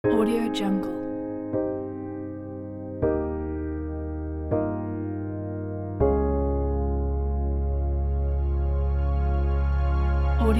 inspiring ambient soundscape

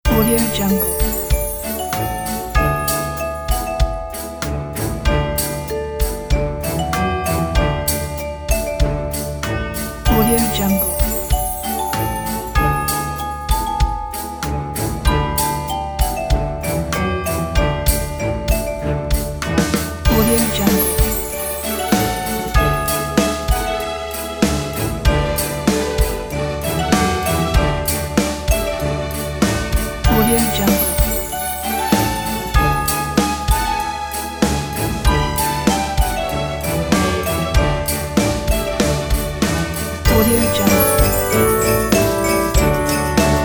christmas for kids

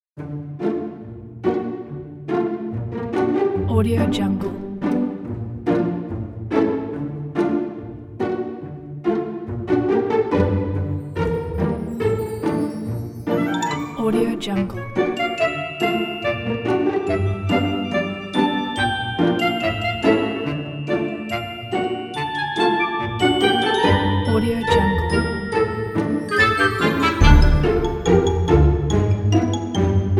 comedy and humor

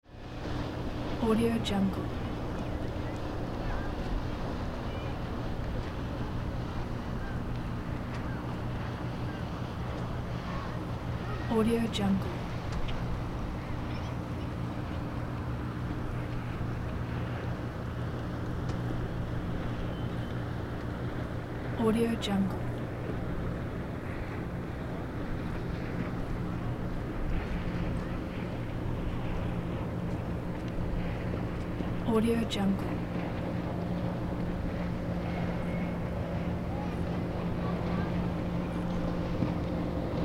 beach boats ocean summer background

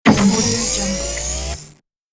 spaceship door open

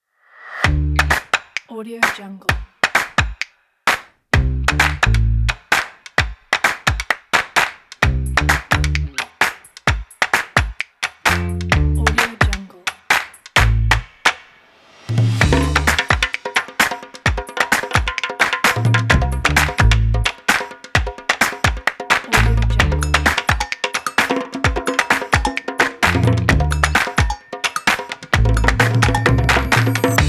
sports podcast

